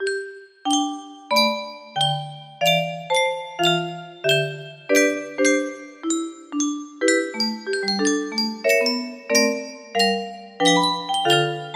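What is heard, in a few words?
music box melody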